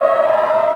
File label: fiend; kult